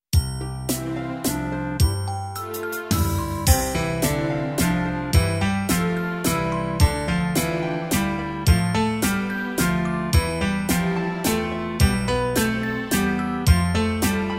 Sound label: waltz